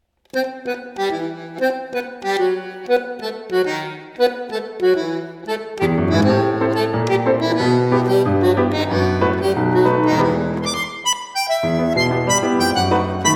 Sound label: Milonga